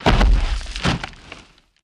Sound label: Body Falls On Dirt